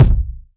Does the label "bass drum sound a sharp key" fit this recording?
Yes